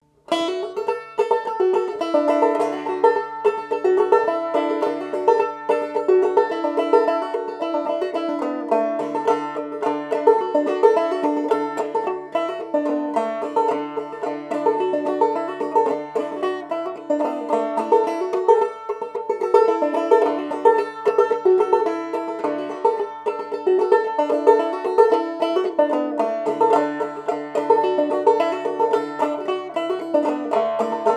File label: Banjo D; Johnson Boys